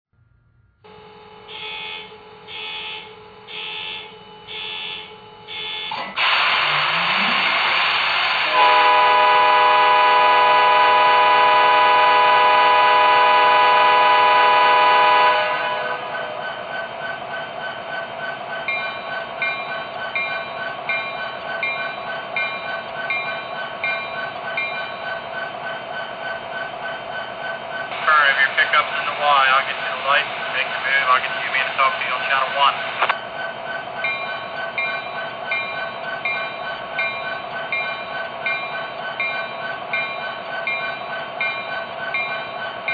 sd70ace, electric trains

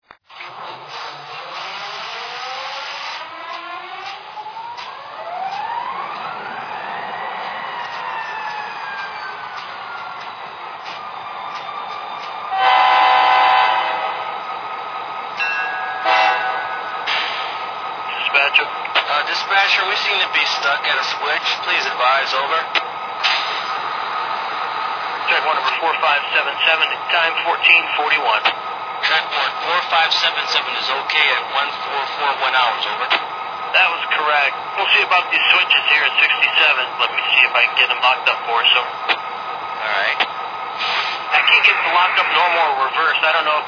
sd50

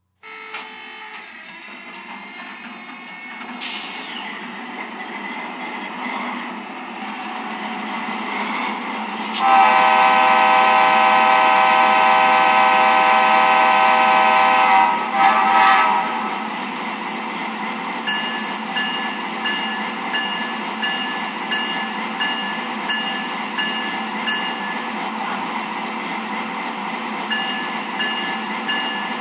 dash8, electric trains

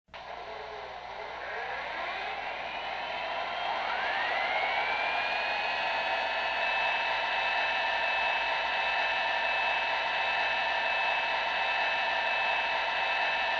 GAS TURB

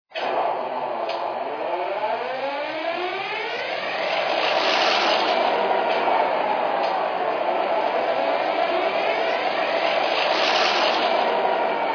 e8, electric trains

